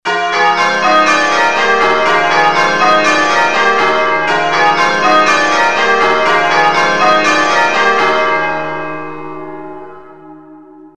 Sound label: Kings, changeringing